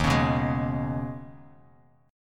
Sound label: D sharp 7th Suspended 4th Sharp 5th D sharp, Bass Standard; strummed; 11,16,9,13; D#7sus4#5; JGuitar